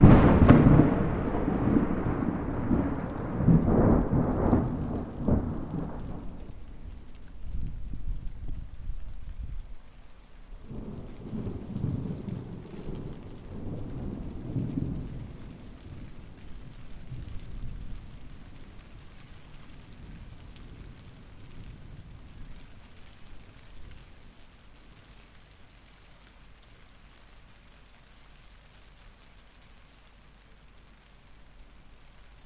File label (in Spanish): Sonido Lluvia y Truenos